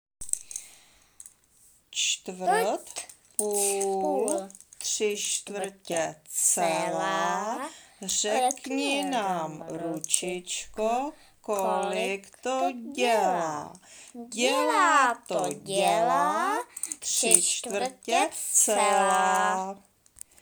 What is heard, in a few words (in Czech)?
hodiny